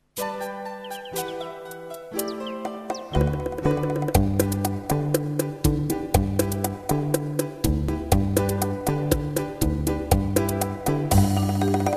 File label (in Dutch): Spinnenlied karaoke